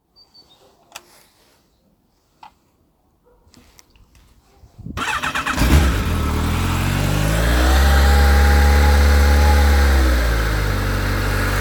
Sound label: Xjr1300 original, yamaha xjr